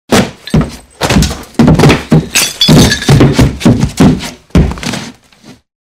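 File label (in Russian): звук падения тела с лестницы; Звуки падения человека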